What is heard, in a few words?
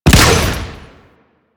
Chem Strike Nox Rifle Shot 3D